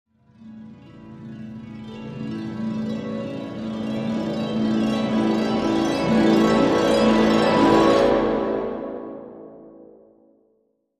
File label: Harp Climb Rise